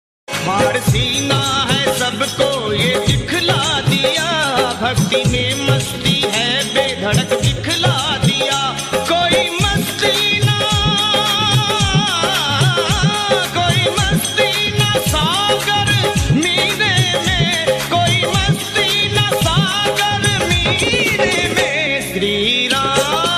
Ram Bhajan Ringtone

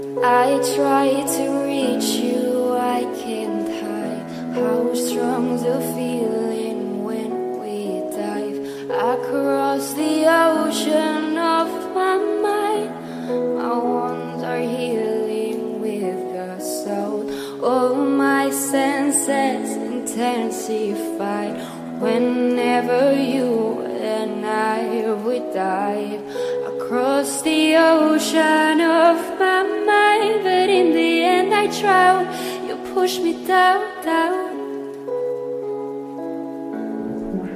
English Song Ringtone

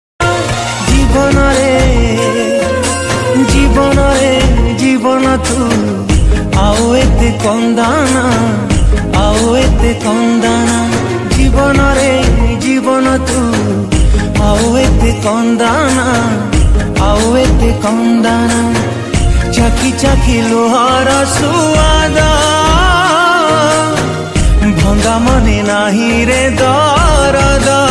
Odia Ringtone

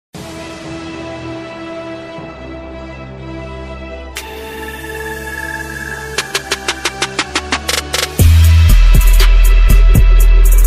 Marimba Remix Ringtone

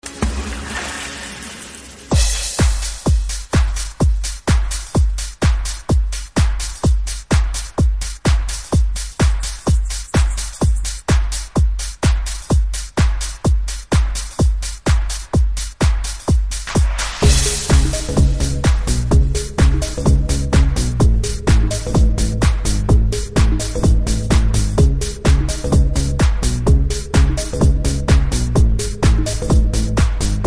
Fantasya Music Ringtone

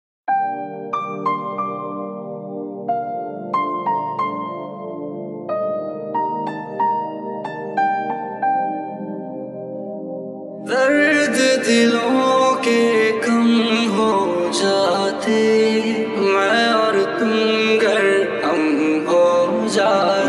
New Sad Hindi; ringtones

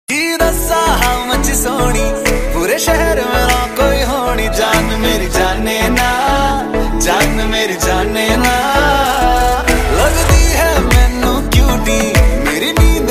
Song Ringtone

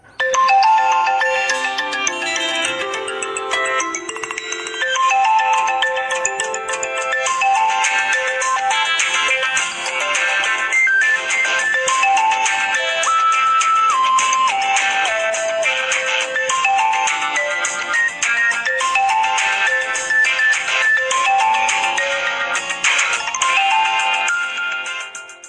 Vivo V9 Ringtone Original; ringtones

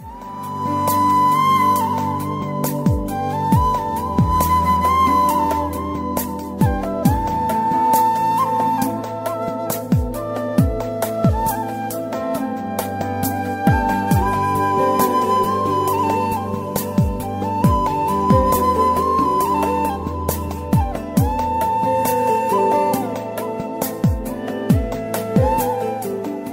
Flute Ringtone For Valentines Day; ringtones

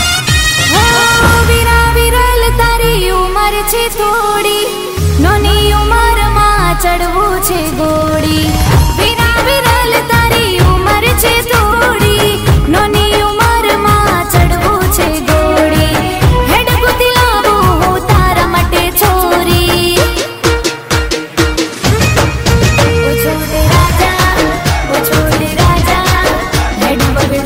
Gujarati Song Ringtone

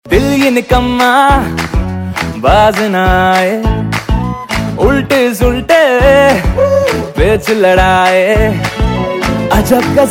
Bollywood Song Ringtone

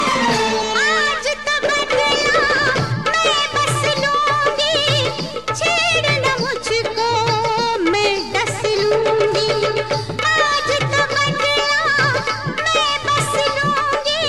Old Hindi Song

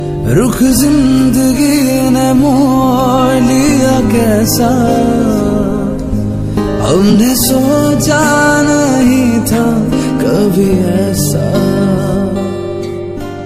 Old Hindi Song Ringtone

